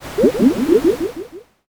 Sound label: poison